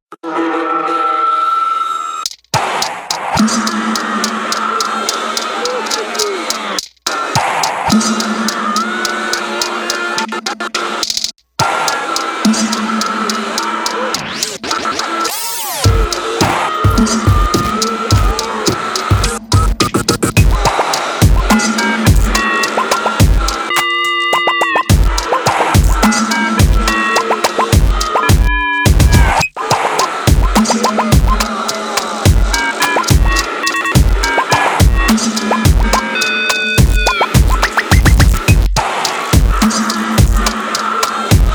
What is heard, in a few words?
trapmetal